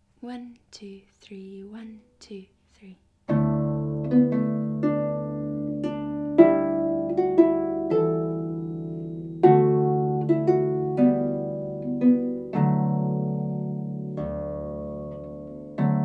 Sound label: Skye Boat Song Full Speed Play through Big Harps